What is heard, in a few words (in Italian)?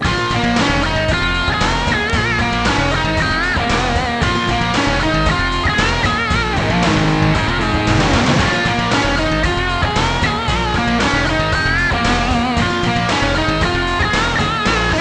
suonerie musica rock